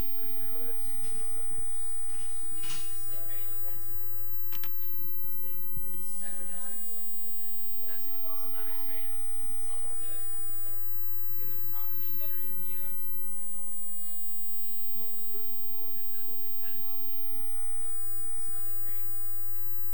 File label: test funct gen 18k